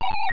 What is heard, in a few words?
crash imminent